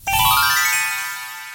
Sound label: magic